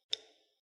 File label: Unlock, ui; Coolpad 1827